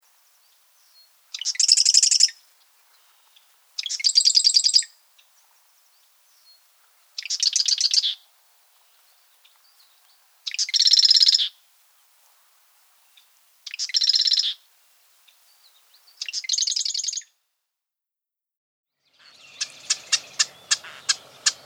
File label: Marsh Wren (Eastern)